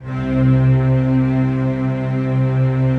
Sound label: PAD, Pads; Best Service; XX Large